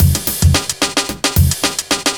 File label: block rock kit